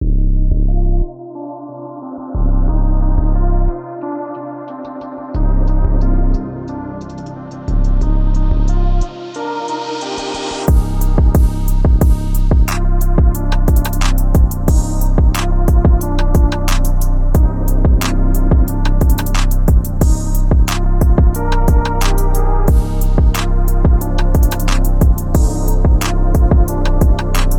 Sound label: Hip-Hop Remix